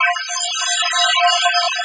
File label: summon; edgar; boss; grimlore